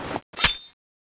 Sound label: guillotine draw; cstrike